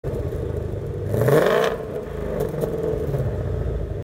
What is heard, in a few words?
Ford Mustang Shelby GT500 Exhaust Sound Sport Mode